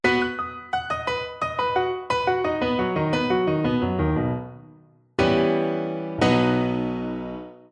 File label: cadences